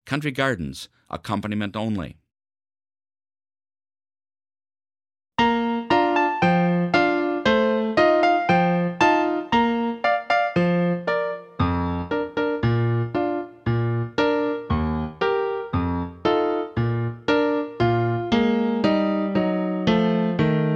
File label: Accompaniment Only; Country Gardens